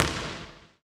Ball Bounce Medium Regular Court

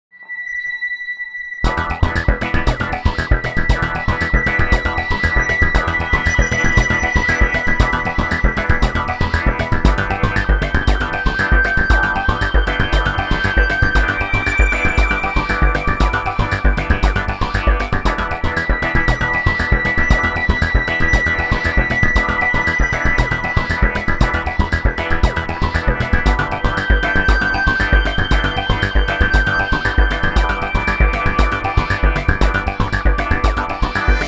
Disturbing Atmosphere; Pokemon Scarlet Violet; Super Music Collection

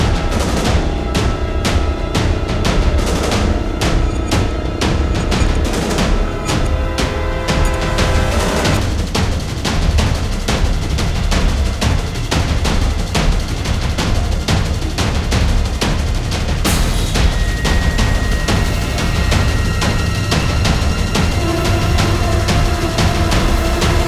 Refinery Train Ending Music; E.T. Armies

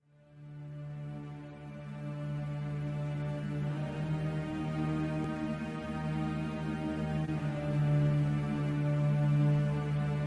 Calm; Evil Dead A Fistful of Boomstick; PS2; Xbox; Evil Dearborn

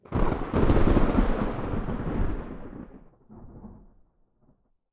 Ambience thunderstrikes; Tricky Towers; PS4

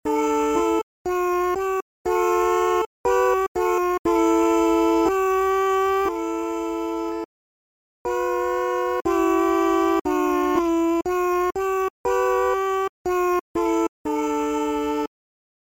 Vox; Mogwai Song 3; Gremlins Gizmo; wii

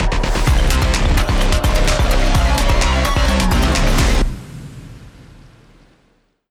Battle (Victory); paper mario the thousand year door complete; Grodus

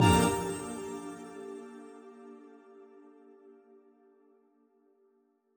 Jingles PING; Semblance

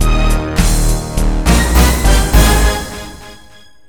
Great Play; And 1 Streetball

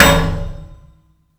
trophy hit; Birthday Party Bash; Wii; sports room sfx

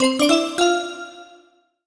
Bell Voice Positive

